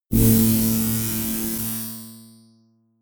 space saw short; energie

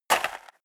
step leather shoes gravel light single right; schritte

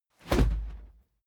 whoosh cloth; swooshes-swishes

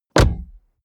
car bmw e46 exterior door front close soft; Cars

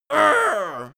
goblin hit; schreie

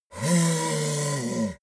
yeti call painful

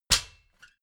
step ladder metal hard single; schritte

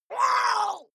water monster female gargle pain short; comedy-cartoon; soundpool

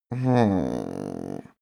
male grumble sad; menschen; koerpergeraeusche; soundpool

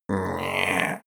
male grumble comfortable; menschen; koerpergeraeusche; soundpool

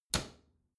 bathroom cabinet close regular

